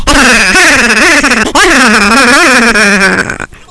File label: aahahahaha